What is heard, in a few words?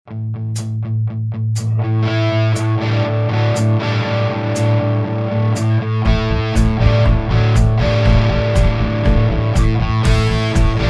Boost